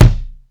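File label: DEEP KICK, HR-16; Hollywood Edge; The Big Bad Beatmachine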